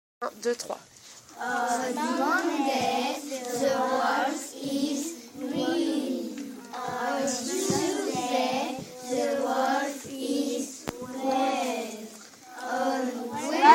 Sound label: Vocal